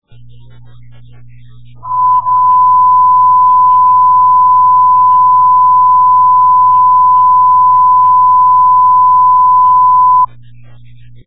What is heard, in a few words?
loud tone, test exchange; sydney